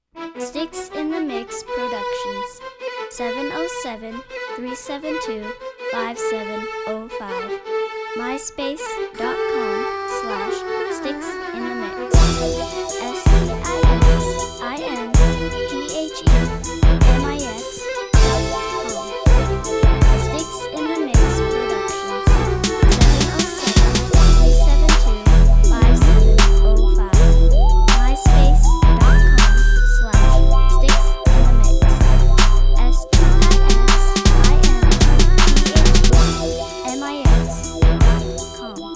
arab crunk

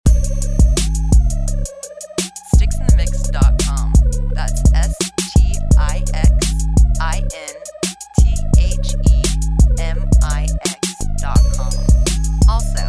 Bass glide

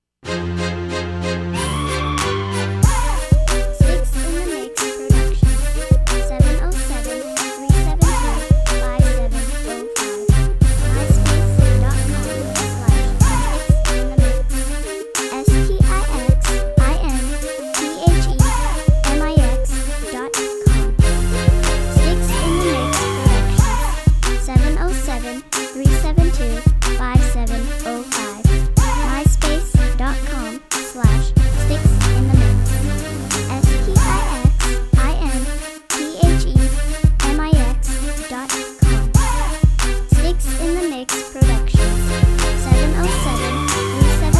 string crunk

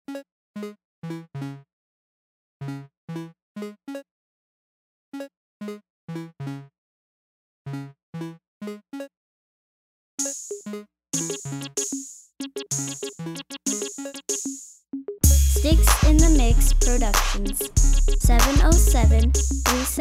hyphy bass

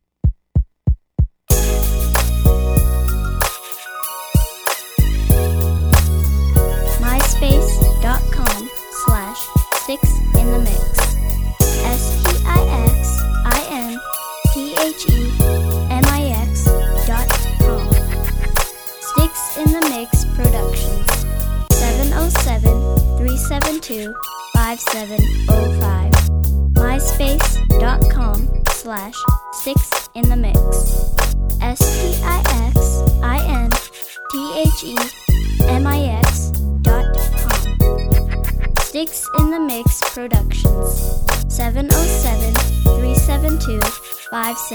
beats

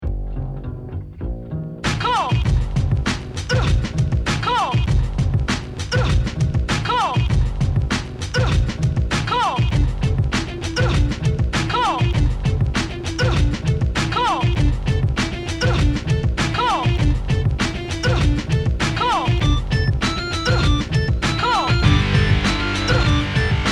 rockmix

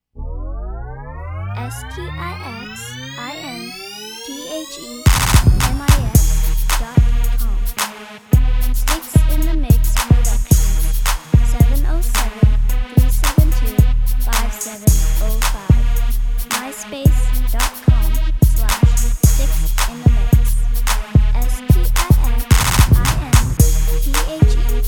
dance trance

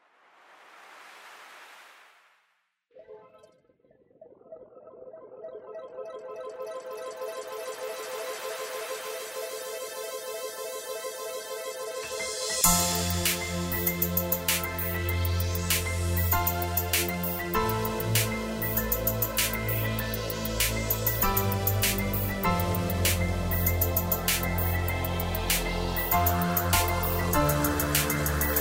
trance r&b

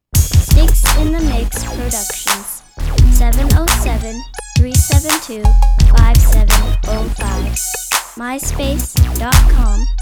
mob click style, beats

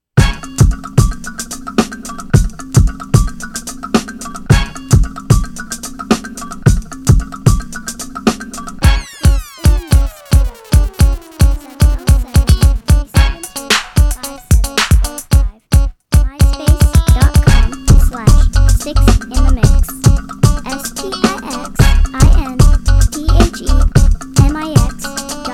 uptempo

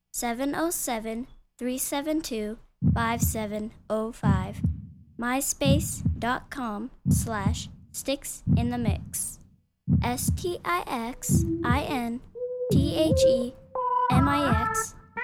crunk

